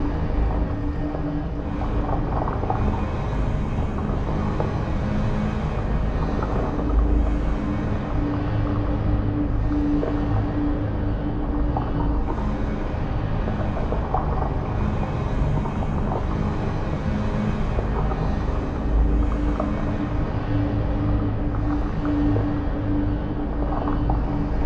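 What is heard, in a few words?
lithoid ambient colony ship hum; Stellaris; lithoids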